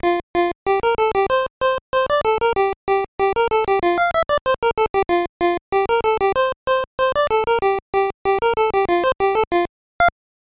seventh inning stretch; rbi baseball 3

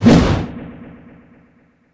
swing miss

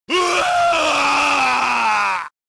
scream; orochi iori

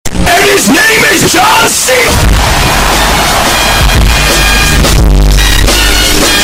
intro bass boosted distorted

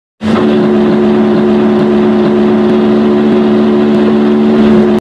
whistle horn; r m s lusitania

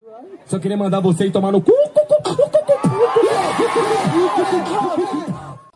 beatbox

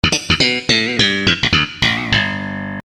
sitcom transition bass riff; seinfeld

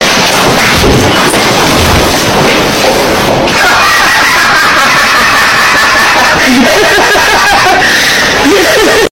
smeh ahahahahah

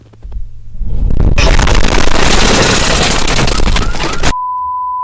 explosion building

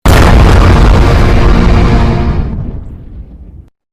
soul guitar

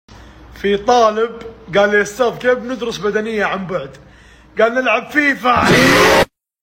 haaaaaaaahhh

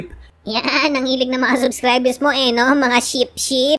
sheep sheep; mga; arkin animation

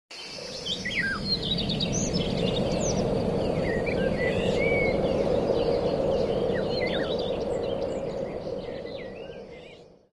birds chirping

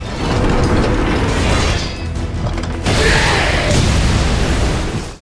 strike; galand

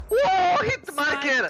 hitmarker

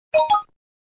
pop ups

